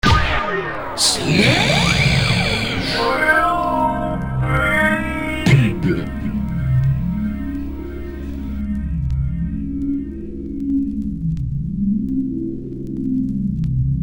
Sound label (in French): JINGLE PUB